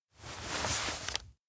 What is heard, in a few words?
bookmark receive; anemoi